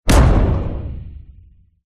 impact slam hard metallic smash against; zapsplat

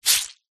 paper towel wet squeeze squelch; foley; zapsplat

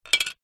leisure toy plastic brick small set down table

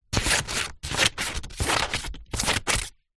office scissors small blunt cut paper sheet; zapsplat

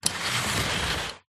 office chair on carpet roll

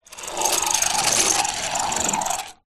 leisure toy skateboard tiny roll floor

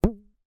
hair elastic band twang; foley

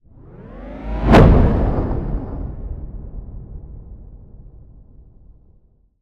Swell To Impact; bottlerocket

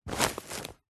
industrial tarpauling bag step on tread foot

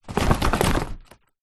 foley suitcase empty shake short

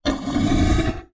horror dinosaur vocalisation or growl

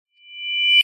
sound design riser short high pitched ring; zapsplat

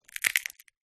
food nut monkey nut shell crush; zapsplat

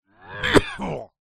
human male adult cough retch; zapsplat

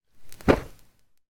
nature fire small flame blow out

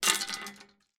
handful of small stones down on metal drain cover; foley

